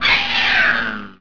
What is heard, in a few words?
SQUEL